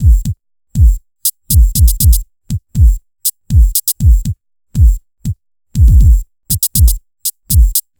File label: Structured Rhythm, IDM Rhythms